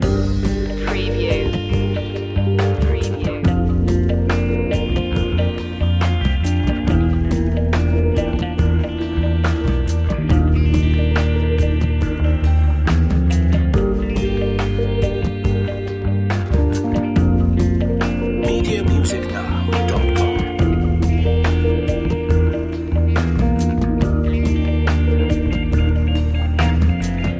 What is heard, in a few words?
ambient magic loop